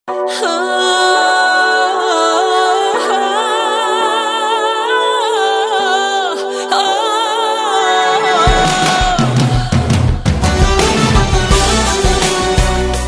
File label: Ringtone Mobile